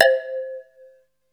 PERC C4; SEQ AND PERC; Music Store - Claudius Diemeter Schwerlos

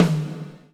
GATE TOM, Drums Acoustic

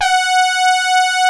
JX STAB F#5, HARD BRASS; Music Store - Claudius Diemeter Schwerlos

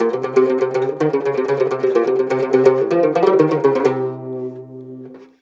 Mediteranean Traditions

